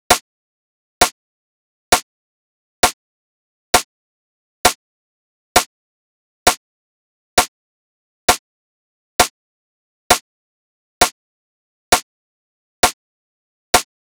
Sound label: Drum Loop 132 BPM Rim, Garage; Loop Stems; Cymatics; GHOST (Beta Pack); Centre Drum Loop